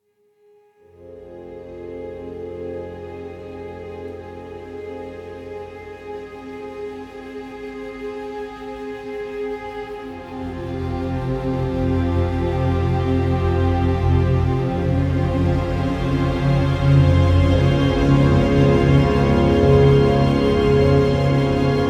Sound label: Wilderness Explore Intensity; Ferelden Wilderness Explore Intensity 28